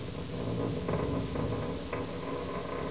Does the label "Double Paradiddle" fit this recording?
No